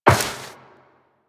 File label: Step Metal Perforated, Footsteps Walk; DE; Warframe; Tenno